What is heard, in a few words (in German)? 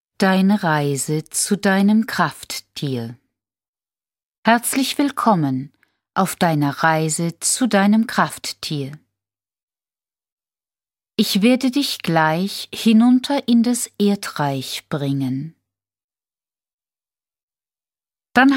schamanische reise